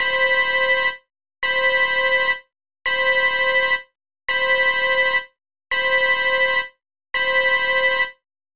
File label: EAS alarm; Bahamas; Mock